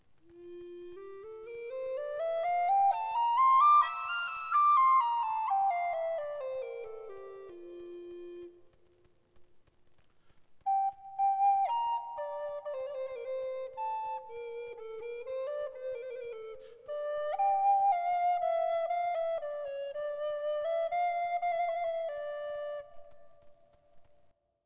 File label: alto, flute baroque